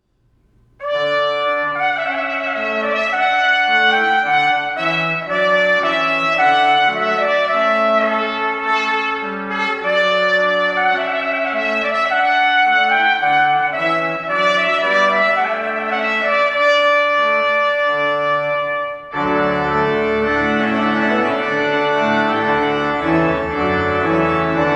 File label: Trumpet Voluntary Organ